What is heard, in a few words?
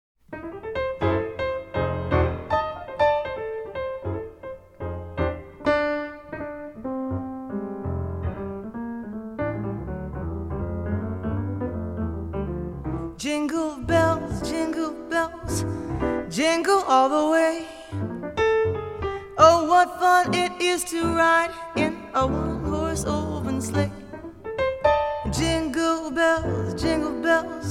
jingle bells